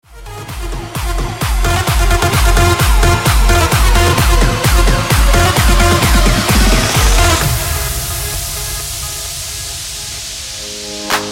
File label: clap drop 130 bpm